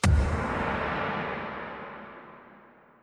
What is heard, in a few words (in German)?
menu beginn des kampfes; magic 2014